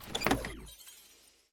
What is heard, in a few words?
Chest Open; unsighted